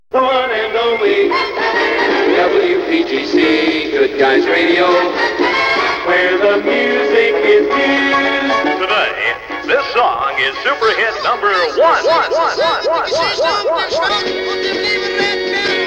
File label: jingles, where the music is news